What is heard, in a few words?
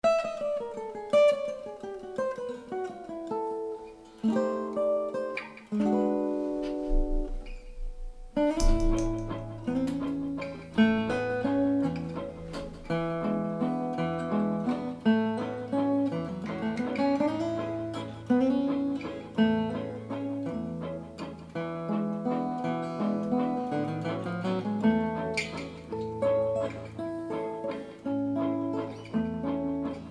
Spanish Fandango